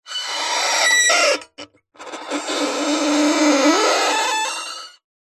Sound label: scrape screech bowed misc; foley